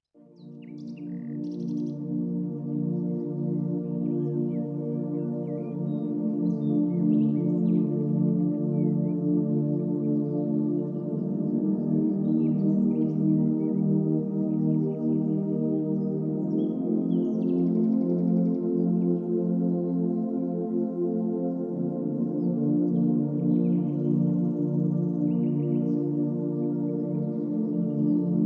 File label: Spa music massage harp rain and birds; barcelo spa; Spa 2024